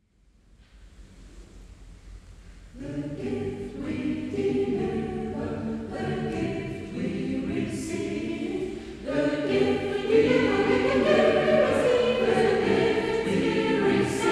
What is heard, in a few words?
Tutti